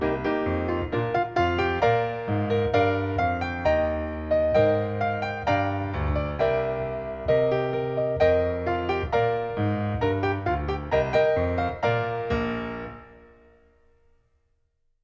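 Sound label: accompaniment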